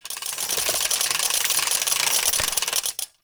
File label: Belt Fed Rifle Reload D; Warframe; Tenno; Frumentarius